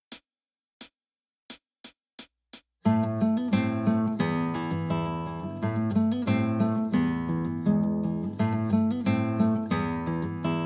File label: Ungaresca, Guitar; Bathioli; Play Guitar Spielbuch